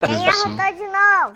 arroto de novo

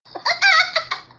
rindo

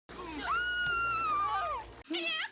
gritando

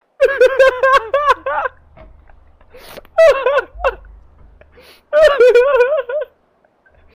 risada

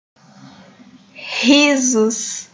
risos

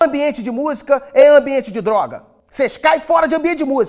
ambiente de musica e ambiente de droga

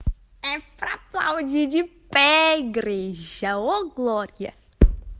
é pra aplaudir de pé igreja

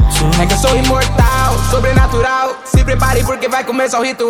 rap

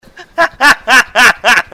risada

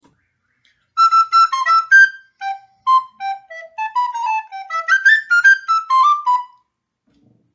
flauta doce; super mario

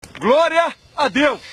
jingle da campanha; daciolo; campanha 51

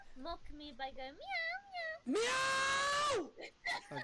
miau

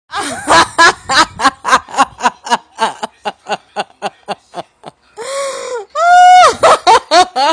risada

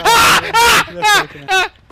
grito; michael myers

